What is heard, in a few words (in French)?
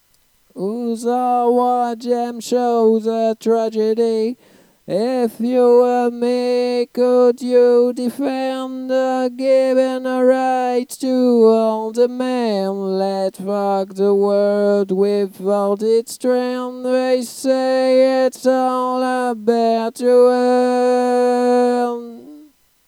refrain chant